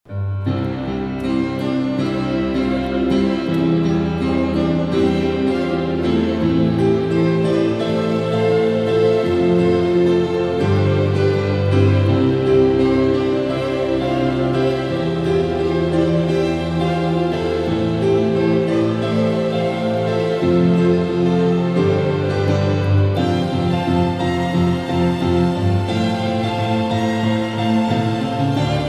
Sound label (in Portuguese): Piano e Cordas